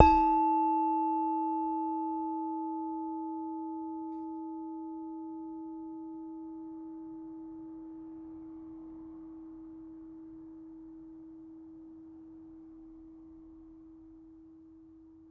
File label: Saron D, Gamelan